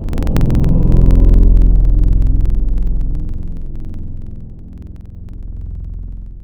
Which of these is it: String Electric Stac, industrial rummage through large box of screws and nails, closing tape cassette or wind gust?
String Electric Stac